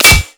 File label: headshot; Call of Duty Modern Warfare